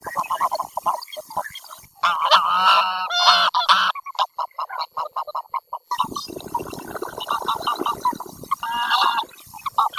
OCA SELVATICA

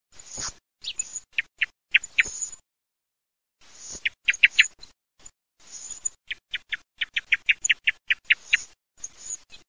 TORDO

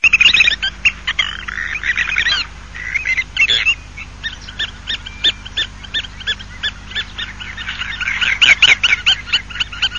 GALLINELLA

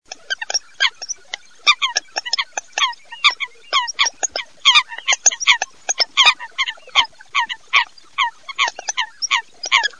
folaga

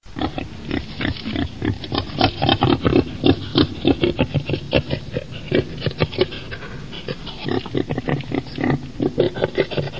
CINGHIALE

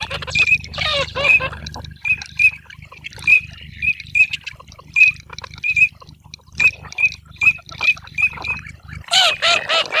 ALZAVOLA

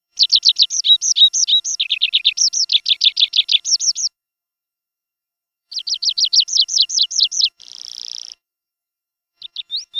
prispolone, birdsound

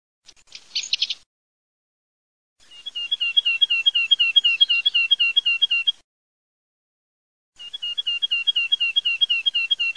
PICCHIO MURATORE, birdsound

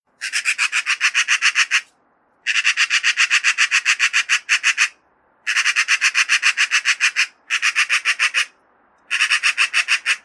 Gazza

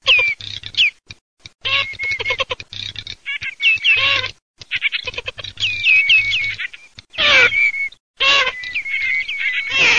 MARZAIOLA COMBATT GAMBETTA

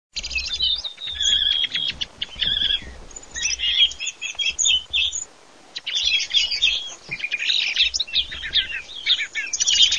tordi capanno

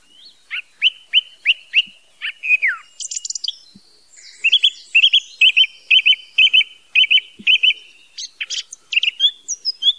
tordo catalano

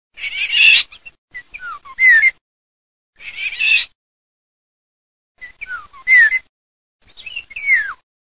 RIGOGOLO

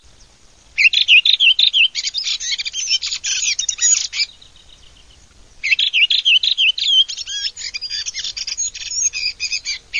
SASSELLO VERSO

